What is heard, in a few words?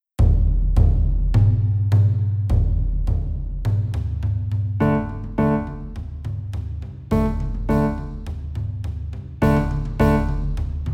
Forest Drums Slow